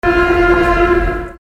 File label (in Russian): tifon